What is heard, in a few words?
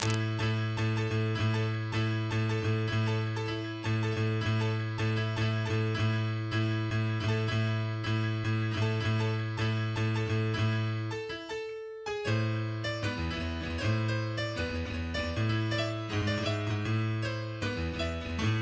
Piano; Mashle